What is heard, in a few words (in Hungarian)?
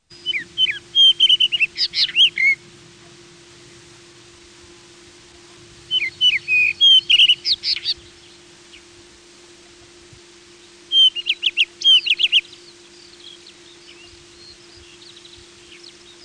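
Kővirigó; Madarak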